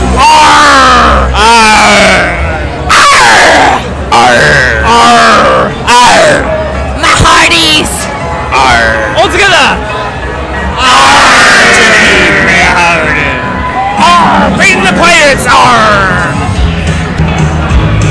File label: argh